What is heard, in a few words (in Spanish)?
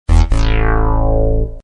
sonido Error